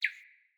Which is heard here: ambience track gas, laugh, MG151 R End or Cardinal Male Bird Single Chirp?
Cardinal Male Bird Single Chirp